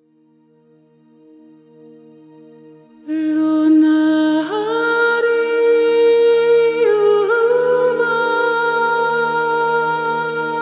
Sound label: Lyrical Vocal Phrases; Sonuscore